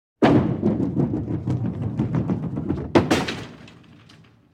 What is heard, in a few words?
Throw Bowling Ball w Roll Down Alley & Into Gutter; Sports